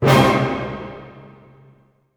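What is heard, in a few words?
C minor fortissimo, orchestra hits; Sound On Sound - Peter Siedleczek's Orchestra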